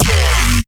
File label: bass c